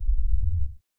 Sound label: low subtle thr, fitness